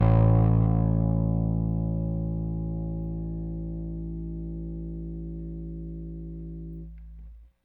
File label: RickThumb-C, RickenbackerBass